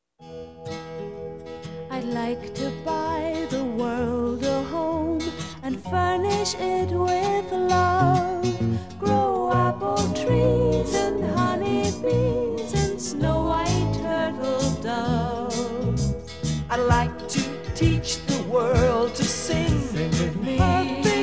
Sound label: Jingle